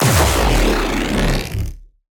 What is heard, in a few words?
Zap Short; Rescopic Sound; Parallax